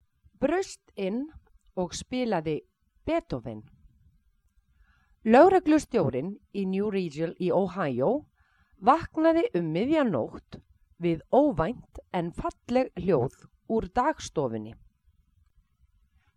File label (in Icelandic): piano; innbrot; stutt verkefni